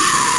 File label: Arrg; GBA; MARIOVDK; Donkey Kong